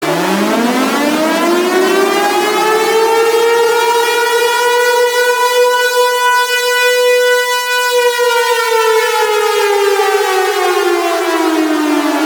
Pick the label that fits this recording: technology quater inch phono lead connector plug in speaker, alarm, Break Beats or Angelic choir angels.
alarm